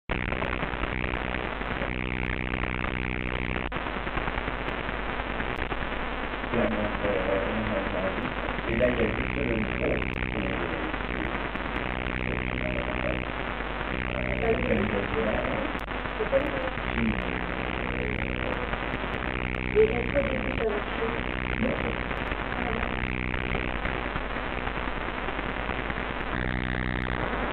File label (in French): micro seulement; camera IP; Foscam FI8918W